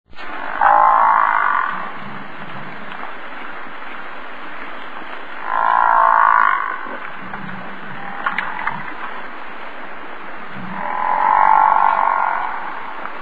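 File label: bison voice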